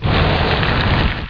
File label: Flame; BOOM